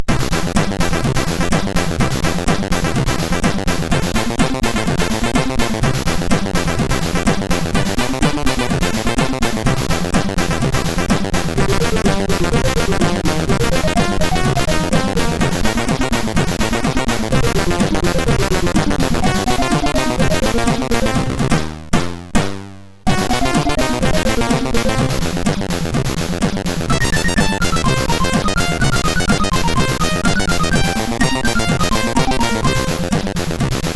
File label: Rock Line, sid MOS6581R4